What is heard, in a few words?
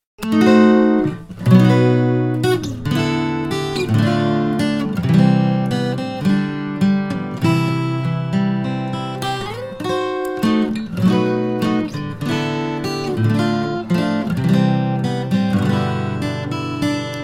Wedding March; Recessional; Mendelssohn